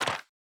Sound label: wooden small